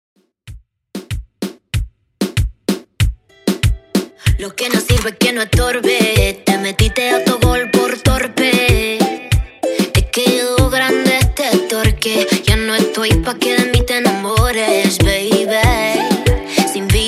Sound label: party reggaeton 95 bpm